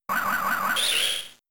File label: Whirl Wind